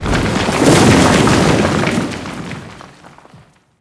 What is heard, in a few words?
junk debris, ambient